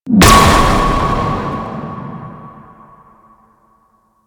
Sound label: Puzzle Door Complete; Ambience; Warframe; Infested; Golem Trial